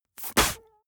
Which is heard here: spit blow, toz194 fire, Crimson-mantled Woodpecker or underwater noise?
spit blow